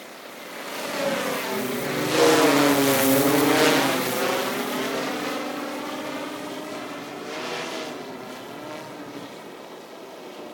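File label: Quadcopter Fly By Slow Exterior; Audio Shade; Quadcopter Flight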